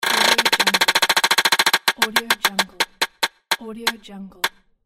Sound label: fortune wheel